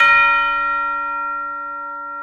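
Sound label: Tuba A#3; Digital Sound Factory Virtuoso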